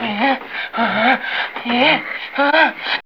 SEX VOX

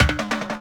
ROLLS; E-Lab; X Static Goldmine 2

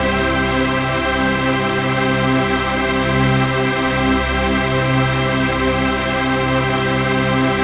RAVE PAD; Best Service; XX Large; Pads

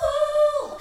VOC

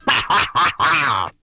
LAUGHING, Vocal Jazz Fx

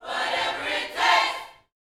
YOUTH CH PHRASE, Vocal Planet Gospel

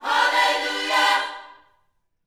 Vocal Gospel PHRASE; Spectrasonics; Vocal Planet Vol.1 Gospel

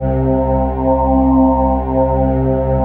PHASE PAD, ATMO PADS; Best Service; XX Large

